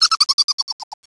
Mouse Laugh; psion cd vol1

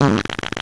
RASP

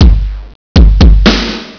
DRUM

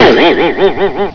Vibrate Saw; psion cd vol1

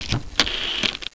Phone Dial

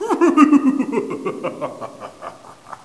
BIG GHOST

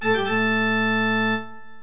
ORGAN; psion cd vol1

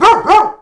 DOG

